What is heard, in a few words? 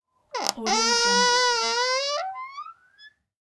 squeak door